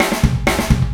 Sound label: Fill Ins 128BPM; Vengeance Samples; VEH2 Loops